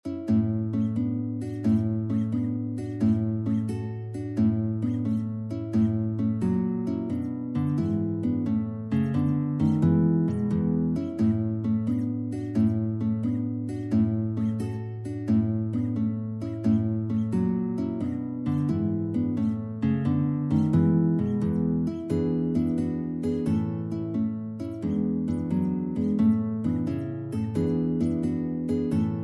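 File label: 2 guitars, guitar duet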